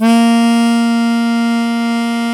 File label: HARMONICA